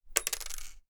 Dropping Metal Pin On Wood

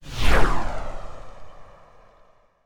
Meteor Super Fast Whoosh

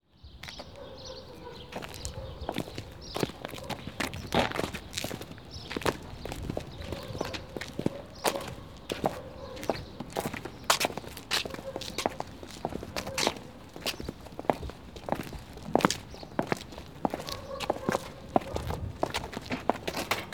Steps Outside

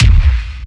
Earthshake kick

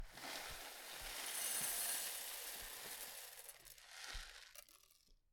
Pour

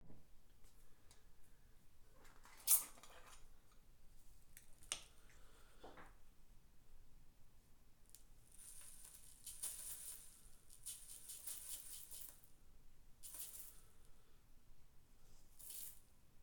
Powder Bath Salt

